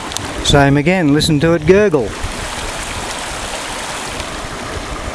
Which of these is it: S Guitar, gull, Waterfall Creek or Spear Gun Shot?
Waterfall Creek